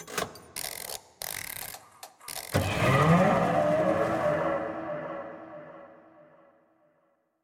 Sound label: Windup Sequence; super mombo quest